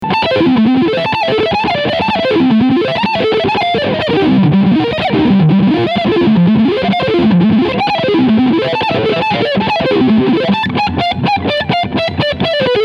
PRS Custom 24 Floyd Leadsound Front Humbucker

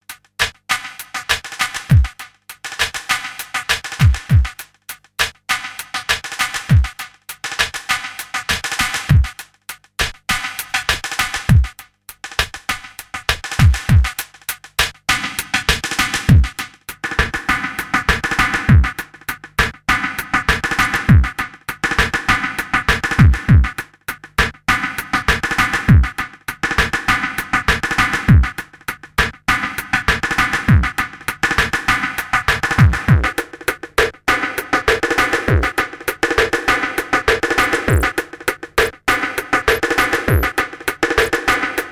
Percussion; Stramp Synchanger

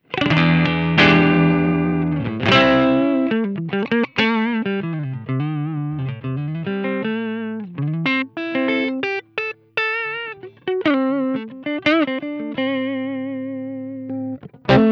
Carl Martin Ampster Green Mid Gain

